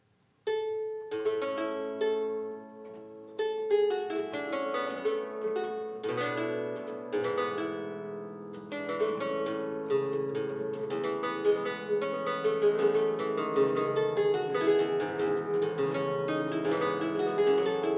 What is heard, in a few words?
Suite B flat Allemand, harpsichord; Johann Pachelbel - Suite in B Flat - Allemande (Front 8') - Performed by Sebastian Bottone; Sorli Harpsichords and Lautenwercke